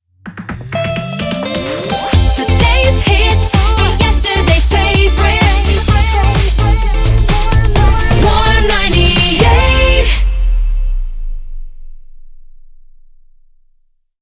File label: Hot 128bpm C Shotgun, jingle; Warm 98